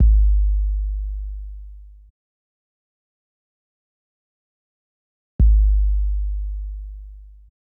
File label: Kick B, 089, Cmin, Drum Tracks